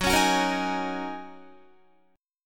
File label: Gb 6th Add 9th Gb down Guitar; Listen to Gb6add9 strummed